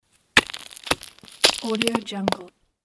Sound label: rock fall